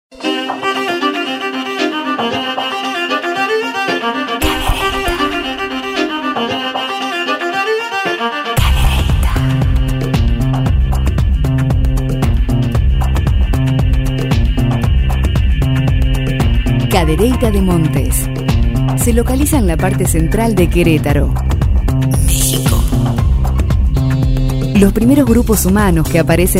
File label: ringtone